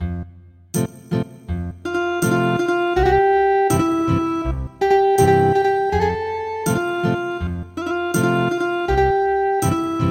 Ethnic Guitar

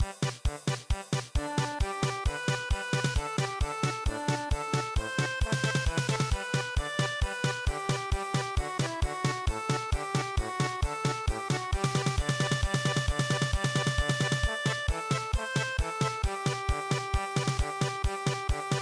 German Polka

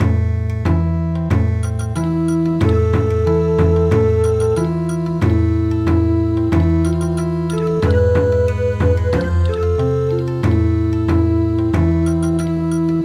Bon Odori; Bubuy bulan

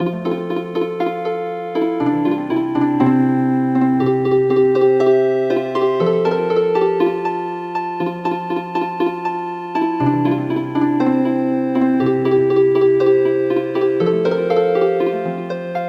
Healin Harp; Chan Mali Chan

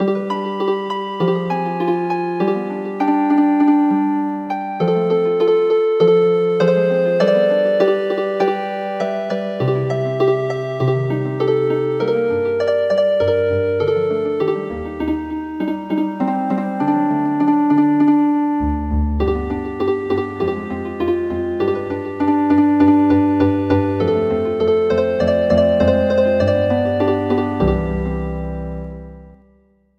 Healin Harp; The bales are fun

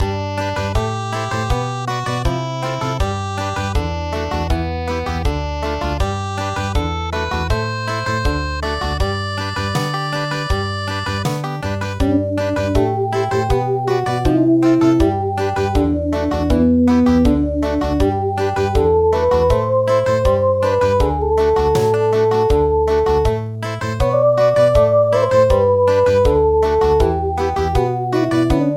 Country, midi; Aegukga

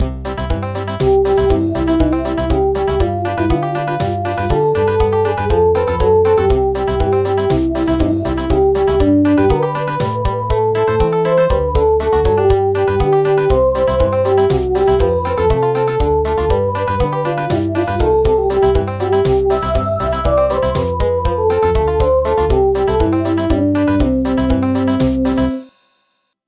Country

